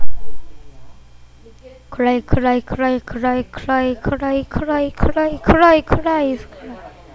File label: cry